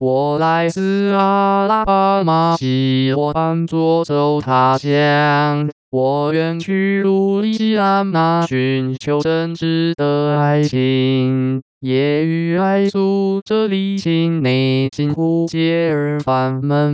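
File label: synthetic singing voice, 男聲, smoothed; 我來自阿拉巴馬, 攜我班左走他鄉; 我遠路易西安那, 尋求真摯的愛情; 使用頻譜包絡內插之國語歌聲合成 (Mandarin Singing Voice Synthesis Using Spectral Envelope Interpolation)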